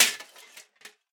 armor hit; minion battlefoley; smite; npc; roman archer

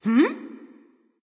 confused; smite; terra

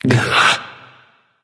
ugh; smite; agni

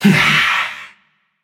grunt attack, voicepack; smite; jorm

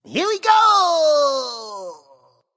battle cry; smite; voicepack; ganesha

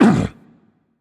grunt hit; fenrir

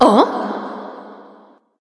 surprised light; smite; voicepack; hel

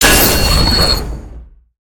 activate; smite; daji

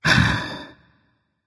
thinking berserker; cuchulainn

